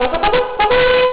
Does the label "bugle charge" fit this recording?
Yes